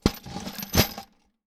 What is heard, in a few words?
Metal Bolts; 99Sounds; The Warehouse